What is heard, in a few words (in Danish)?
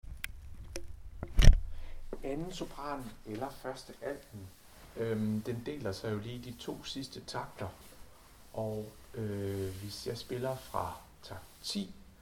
2.sopran 1.alt; yndigt dufter; harlev koret